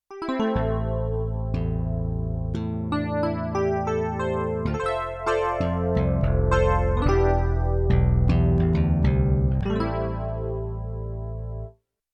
Wire Bass-EP Split, Yamaha TX16W; Combo Performance 1

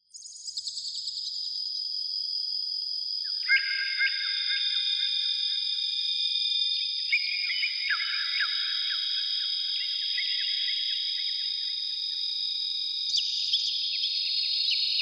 Birds & Bugs, Korg TR-Rack

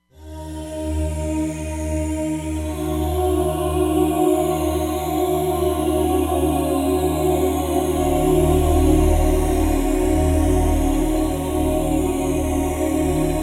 Morph Filter, Roland Fantom XR

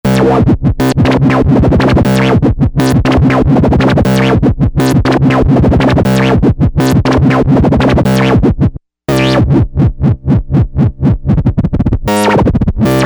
Rate wobble

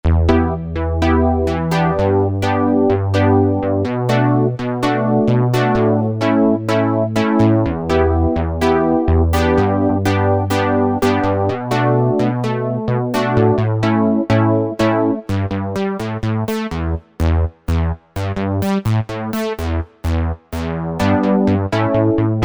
SYNTH; Apple GarageBand 2; SYNTH BASICS; Modern Prophecy

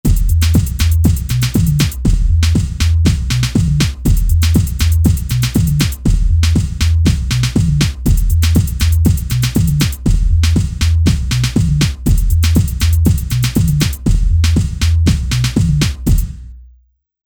Techno

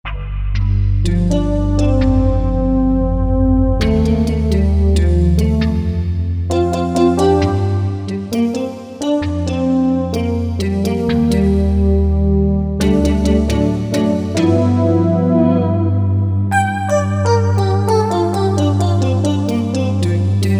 Doot Horn, Korg MSC-11S Brass

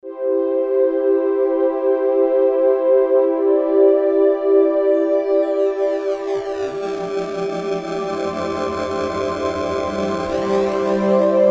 Pad; Novation UltraNova; Dino-Soundpack

